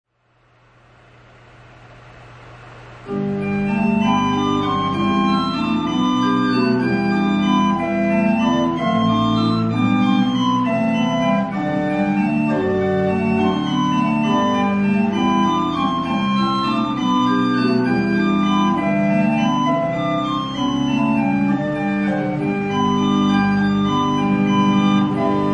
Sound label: jesu joy of mans desiring, organ